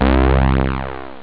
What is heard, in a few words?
Bass; SynthSamples2